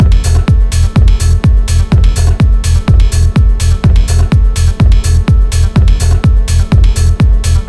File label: drum 125